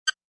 Multimedia, Beep, Button, Menu, Rollover, Web Page Or Flash Animation Sound